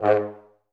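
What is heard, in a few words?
French horn staccato G#2, Advanced Orchestra; Best Service; Peter Siedlaczek's Advanced Orchestra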